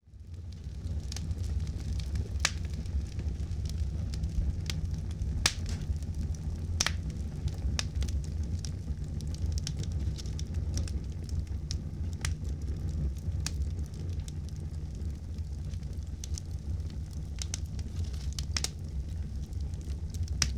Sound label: Campfire